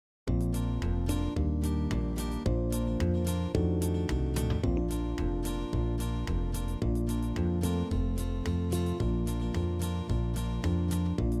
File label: done gone uptempo